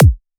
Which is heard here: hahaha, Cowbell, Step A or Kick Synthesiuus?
Kick Synthesiuus